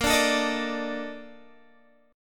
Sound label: Bb Major 11th, Guitar, DADGAD